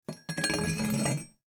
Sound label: fireplace cleaning